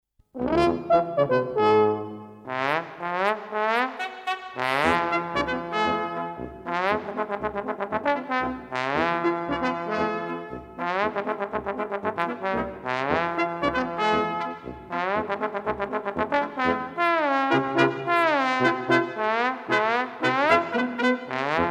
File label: trombone; Henry Fillmore; Lassus trombone